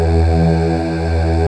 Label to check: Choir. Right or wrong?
Right